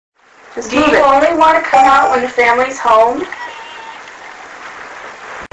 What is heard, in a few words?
whimpering